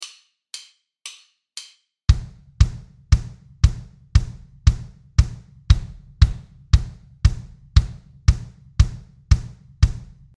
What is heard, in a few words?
Kick